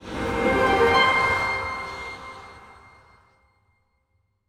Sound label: medium Win; netent